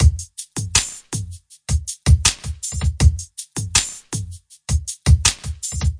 80 double kick bright hat crunch, drum loops and breaks; 000 to 080 bpm